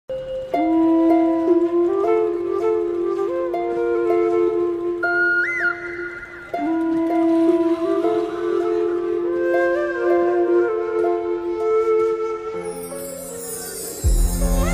romantic ringtone; bgmi; godse

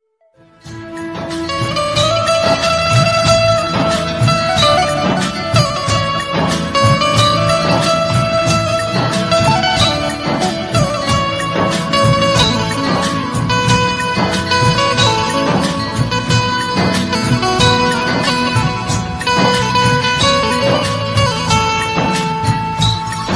desh bhakti ringtone republic day ringtone; 26 january; happy republic day

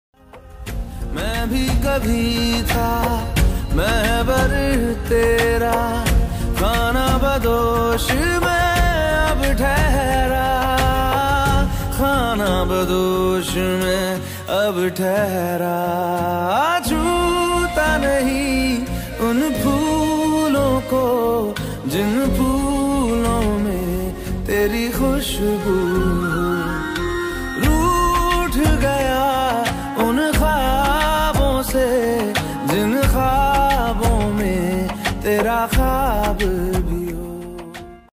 emotional song ringtone sad song ringtone

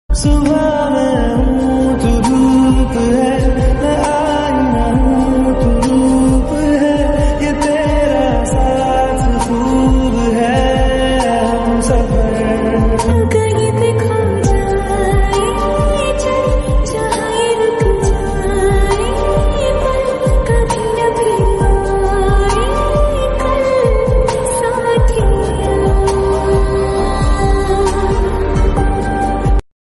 sad song ringtone

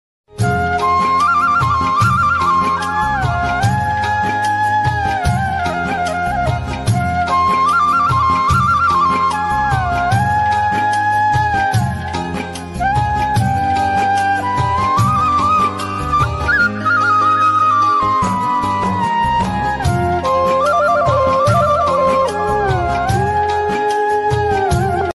old movie flute ringtone; old hero movie

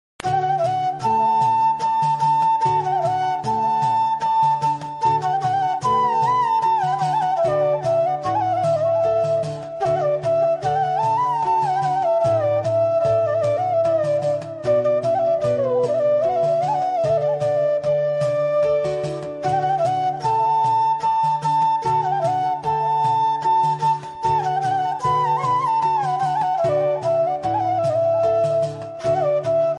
flute, flute music bgm, background music

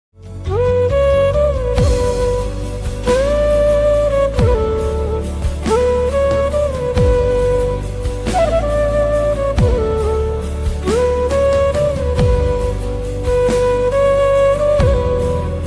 instrumental ringtone, sad instrumental ringtone

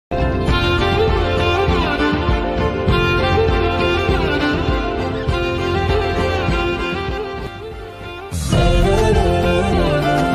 heart touching bgm ringtone; hi nanna

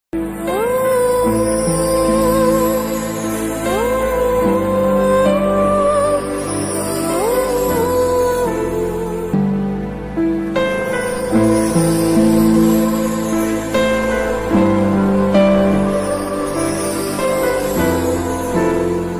movie ringtones bgm song; oy movie

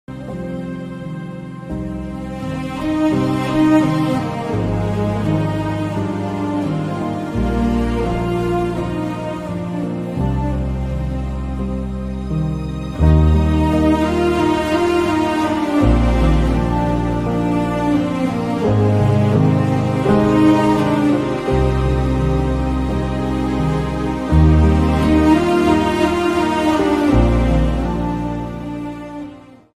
sad bgm ringtone violin bgm ringtone; monk entertainment

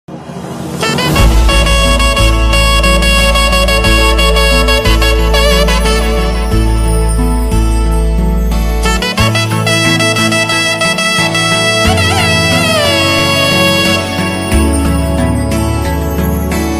romantic ringtone; traffic jam movie; okka ammayi thappa

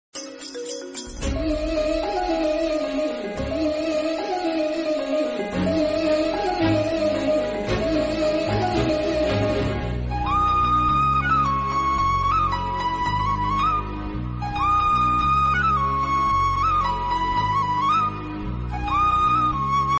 love bgm ringtone, telugu bgm ringtone; pandem kodi; vishal meera jasmin

